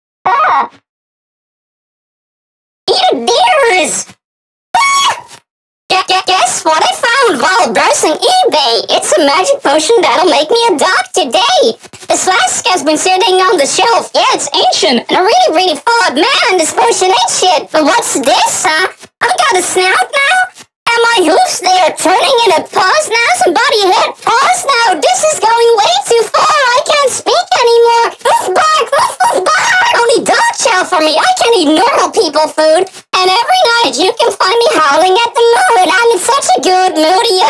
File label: vocals dry